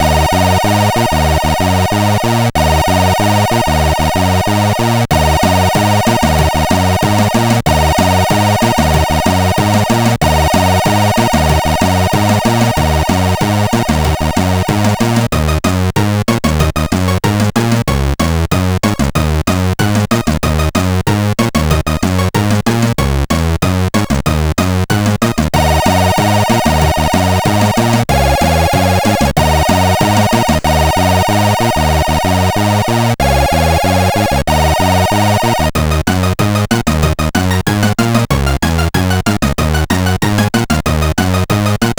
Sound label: Disco, CSG8580R5